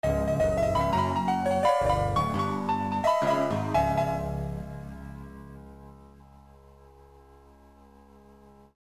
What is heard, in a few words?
qsound logo